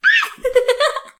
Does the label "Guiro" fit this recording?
No